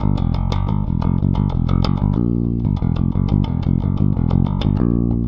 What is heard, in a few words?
Rock, Bass; Bass X